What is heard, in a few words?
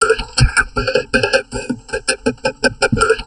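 talk, drum loops crazy processed, 160 bpm; USB Soundscan vol.07; AKAI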